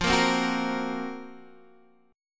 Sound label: Gb Major 7th Flat 5th